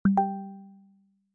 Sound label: notification